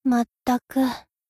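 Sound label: attack; hina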